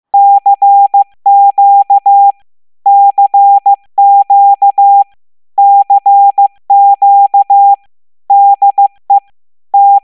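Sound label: cq cw, ham radio; n4emp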